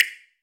check off; saiyku [rby] instafade

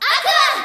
ready

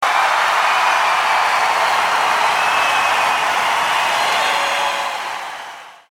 normal hit finish; Nino

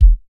heartbeat; muni muni! v1.1

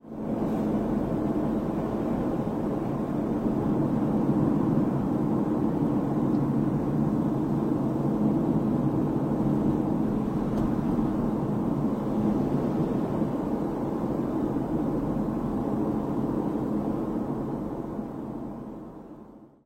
pause loop; If there was an endpoint

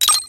menu hit; YoRHa

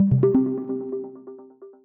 pause continue click; Chip 1.1

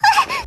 combo break; Chitanda Sagiri+blue angel+changed version

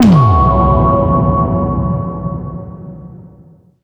card move; penguin

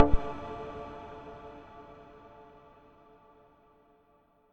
menuback

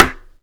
drum hit normal; hitsounds; Bloo v1.1; ryuk new

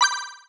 menu play click; Obsidian

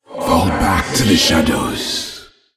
section fail; Baum CursorEdit

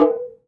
check off; akani-skins; ☆彡Moe[Sagi]ri; Moeriko

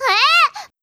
combo break

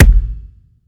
normal hitnormal; Abstract; Hitsound; EliteDonuts Old Hitsound

